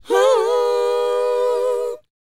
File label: WHOA F#, Vocal R&B & Dance; ILIO; Vocal Planet